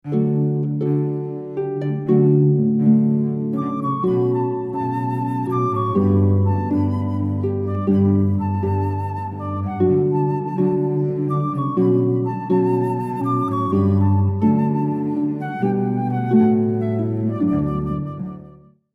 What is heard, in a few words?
harp flute cello